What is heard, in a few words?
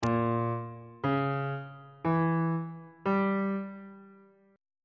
b harmonic minor 7th chord 7 is a sharp diminished 7th on bass clef